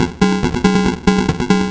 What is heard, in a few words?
Crushed Perc (140BPM); musicradar